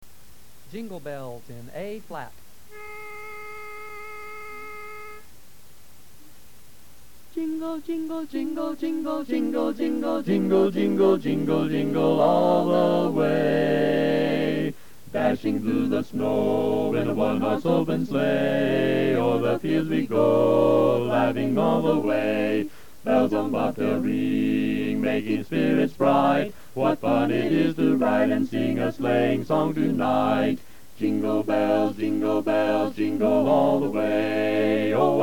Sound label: Jingle Bells Bass, learning tracks; Christmas